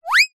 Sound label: cartoon slip trip up